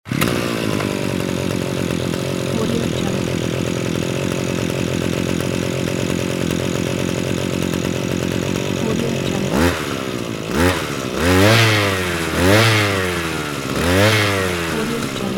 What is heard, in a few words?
motorcycle